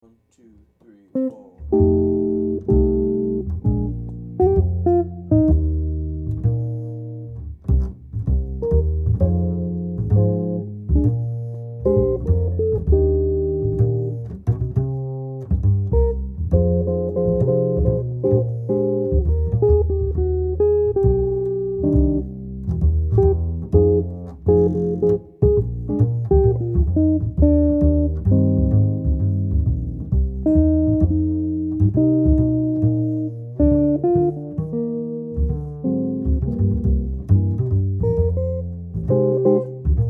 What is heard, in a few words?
Duo Guitar and Bass